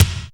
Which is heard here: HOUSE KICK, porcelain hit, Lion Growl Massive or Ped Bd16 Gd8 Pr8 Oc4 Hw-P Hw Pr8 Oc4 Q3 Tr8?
HOUSE KICK